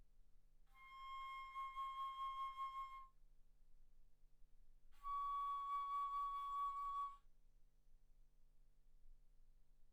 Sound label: Bass Flute ff C6 Db6